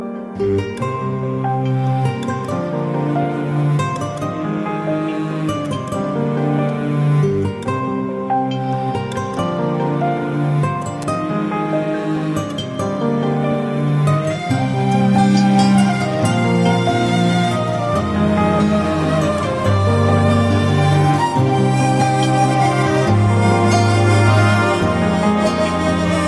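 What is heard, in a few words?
piano flute, ringtones; jazz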